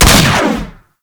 Fire 2D Shot, Rifles; Snipetron

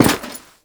Step Default

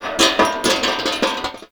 Metal Rattle; Warframe; Tau; Ambience

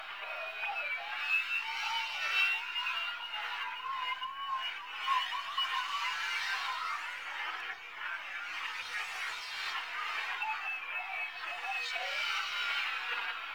Hack Ambience Loop; Warframe; The New War; Narmer Hack

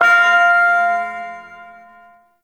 Shawzin Oct Two F; Warframe; Duviri Ersatz Shawzin

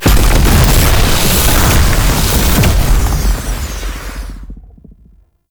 Reactor Explosion; Mod Quest; Warframe; The Teacher

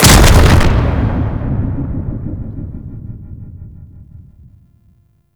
Stomp Impact; Warframe

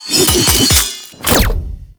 Sword Finisher; Teshin

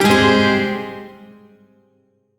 Shawzin Min Chord K; Warframe; Duviri

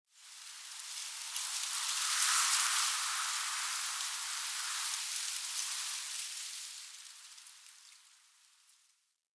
Tree Pine Wind Leaves Relaxed Gust; Trees; Midrath Island; Ambience

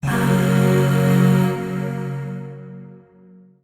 Void Shawzin Yo Chord; Warframe; Zariman; Chords

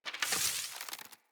Bow Equip End; Weapons; Player; Bows; Fey Thorn Bow

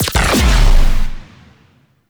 Guided Missile Fire; Crew Ships; Vehicles; Weapons; Warframe; RailJack; Corpus Crew Ship

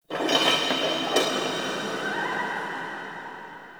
Ghost Horse; Ambience; Dagath; Warframe; Clan Dojo

